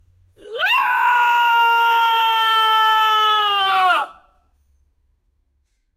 Fear Screaming Man

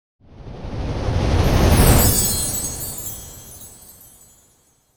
Christmas Whoosh

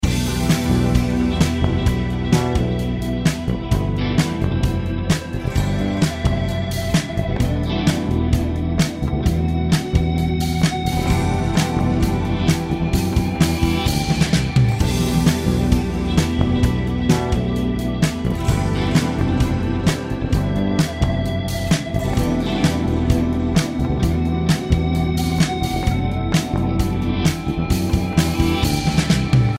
Atmos Rock loop

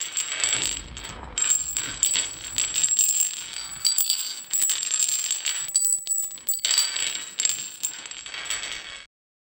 Chain Rattling; Halloween

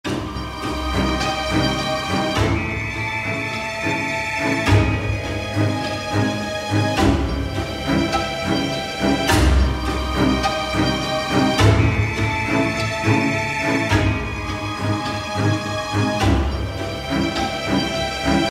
Dark Winter Night loop

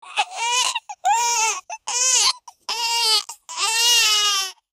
Crying Baby

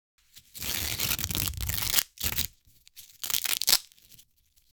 Gentel Tape Rip

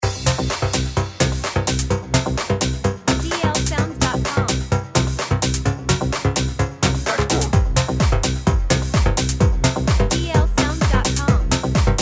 Game Techno Loop

